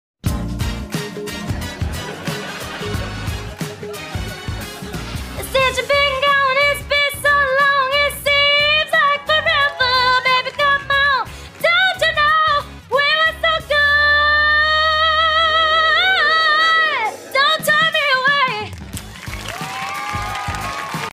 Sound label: amazing vocals; cat valentine